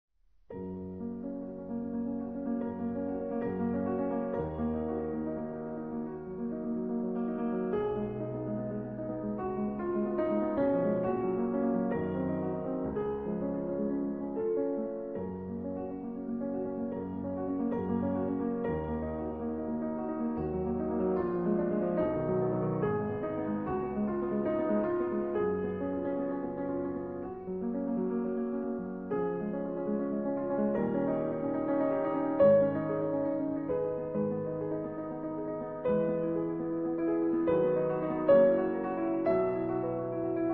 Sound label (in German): Impromptu Ges-Dur; Schubert